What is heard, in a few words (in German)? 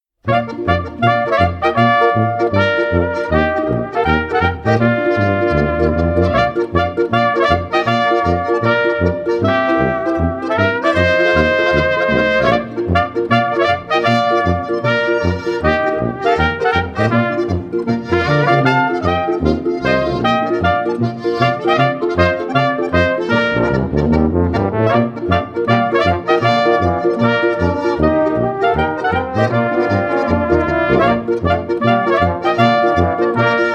Polka